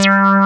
JP6 mouth; E-MU Formula 4000 Series Vol. 3 – Analog Odyssey; VK+ Instruments

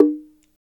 PRC PDC SL, Latin; Roland - Rhythm Section; Latin 4

